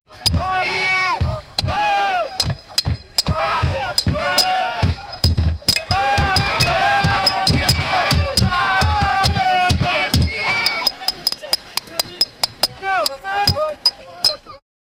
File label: soldiers preparing for ba, drums